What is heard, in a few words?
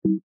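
sounds of operating system mac os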